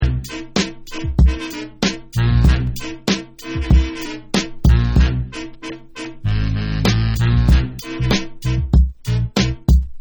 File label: ROCK Instrumental